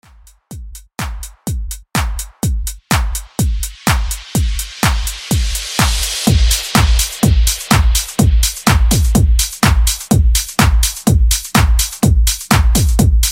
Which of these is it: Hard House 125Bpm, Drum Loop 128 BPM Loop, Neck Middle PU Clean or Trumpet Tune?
Hard House 125Bpm